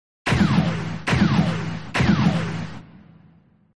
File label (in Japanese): 120mmロングレンジライフル風発射音